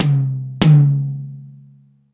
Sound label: Toms, Drum Machines E-MU Pro-Cussion